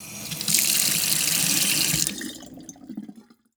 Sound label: Sink Fill